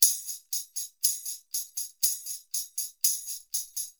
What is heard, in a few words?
percussion loops 120; Sampleheads; NYC Percussionworks; 90 s Sample CDs